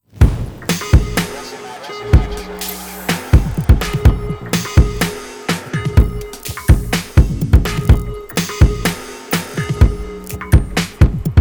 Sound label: robot dance